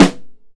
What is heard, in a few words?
dark sounding snare drum sample C sharp key, acoustic snare tuned to the C# note